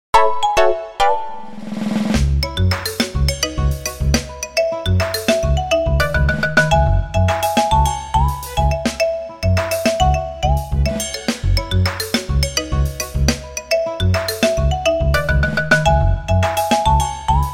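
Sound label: Nokia City Life, ringtone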